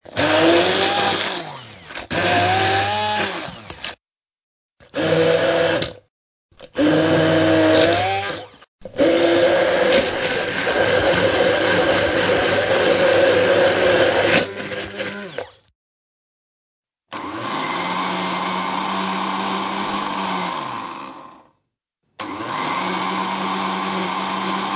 Tieng may tron xay thuc an trong bep

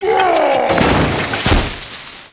tieng ram cua con quai vat chet dau don

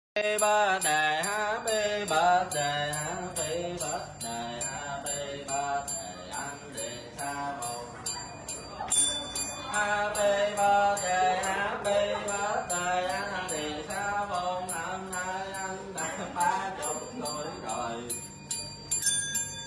Nhạc happy birthday tưng kinh

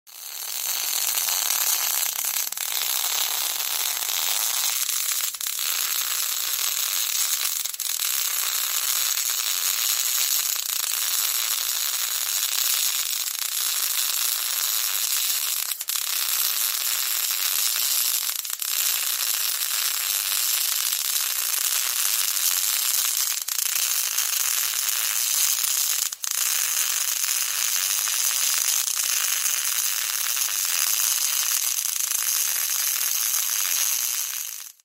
tieng han co khi nhu tig va mig mag

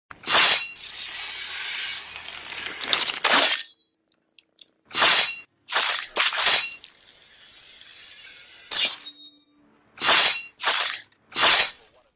tieng mong vuot sac ben cao xe

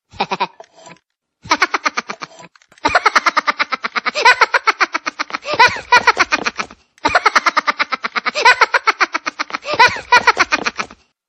Nhạc chuông minion cười

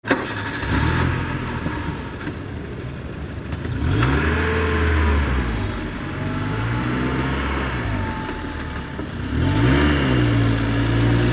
Tieng xe khoi dong va chay

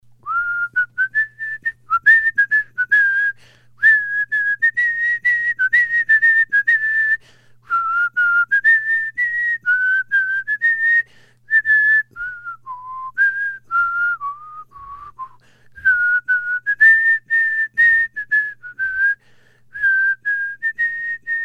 Tieng huyt sao

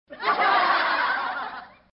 Tieng nhom hoc sinh sinh vien cuoi trong lop hoc

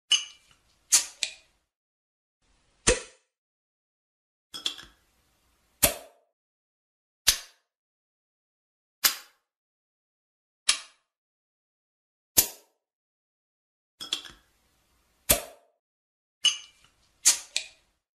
Tong hop cac tieng khui chai bia bat nap chai bia

